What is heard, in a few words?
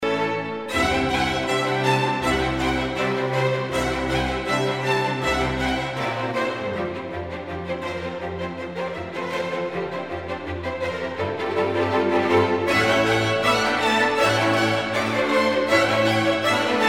Seven Romanian Folk Dances for orchestra; Bartok